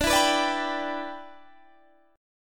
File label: G 6th D